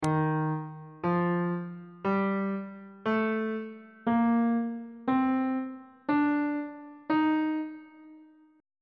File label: d sharp lydian mode on bass clef